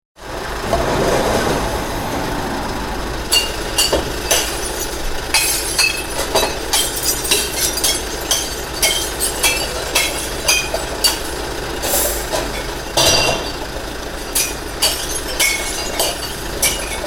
Glass recycling vehicle collecting and smashing bottles; Materials